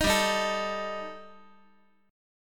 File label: G Suspended 2nd Sharp 5th G, Guitar, 4A, 4E, 5A, 5D, 5G sharp, 6C sharp; strummed; x,x,6,5,7,8